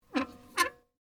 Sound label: Safe Door Open, Small; Miscellaneous Door Sound Effects; SoundEffects-BlastWave